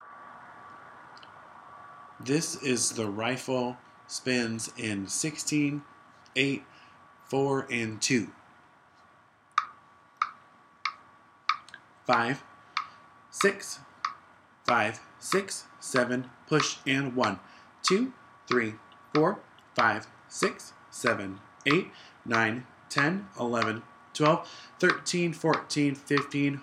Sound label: rifle drop spins at 94